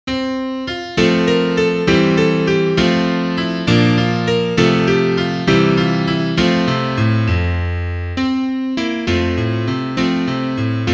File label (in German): Klavier Solo, trompete; Air